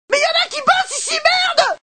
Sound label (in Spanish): tikal perro; guatemala